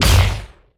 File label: Arc Nova Diffuser Small Shot